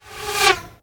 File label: medieval scythian recurve arrow approach; still north media